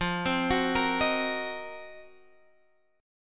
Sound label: C Minor 7th Flat 5th F, chord